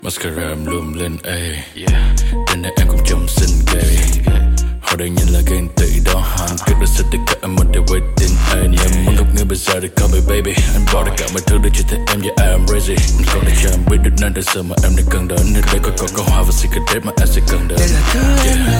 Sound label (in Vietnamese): Nhac Chuong